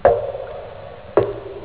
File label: DRIPS, BATHROOM; EFFECTS